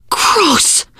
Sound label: reaction disgusted, teen girl, survivor voice; source engine; left 4 dead 2